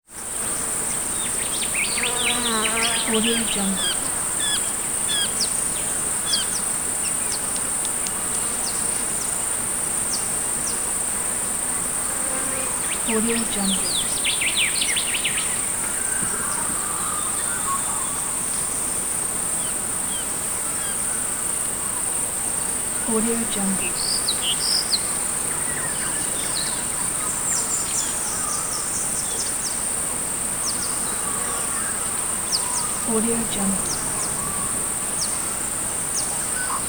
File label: swamp jungle constant cicadas chirping; prosoundfx